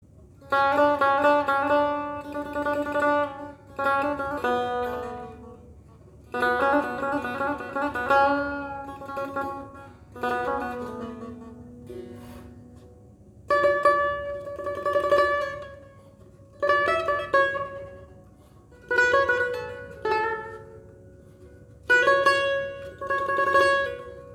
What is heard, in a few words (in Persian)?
صدای واقعی سه تار با رویه کاسه پوستی; سه-تار-جاللدین